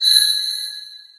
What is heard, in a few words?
amb rand abstract hi; source engine; portal2